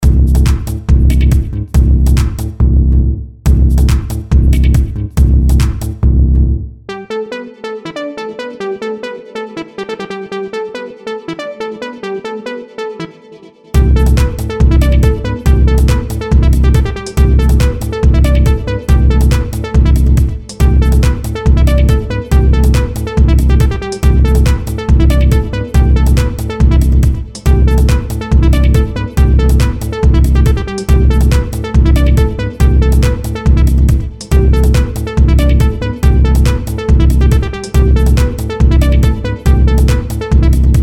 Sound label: beat house; baicoianu andrei